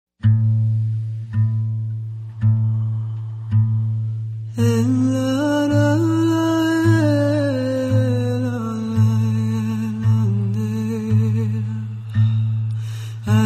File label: breathwork music only; music for healings